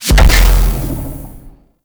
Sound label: Beam Fire; Warframe; The New War; Erra; Abilities